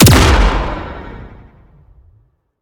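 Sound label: Sci weapon Shot Medium Gun Sharp Shooter; Robobeat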